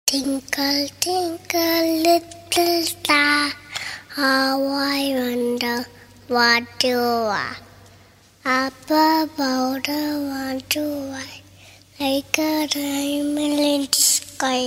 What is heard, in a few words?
Baby Rhymes